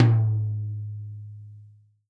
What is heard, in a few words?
tom, Real Drum Kit, funk kit